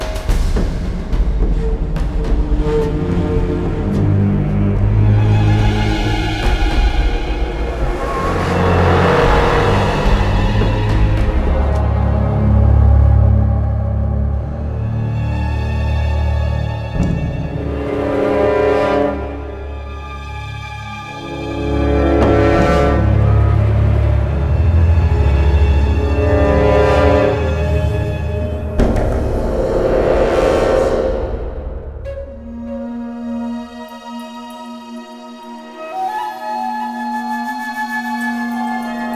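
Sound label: Suspense loop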